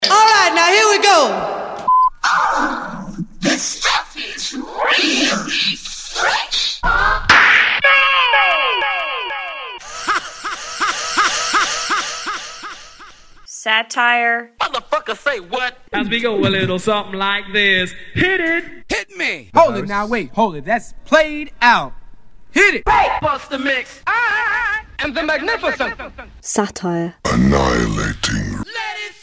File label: Sat Scratches